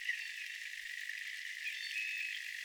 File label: Chirp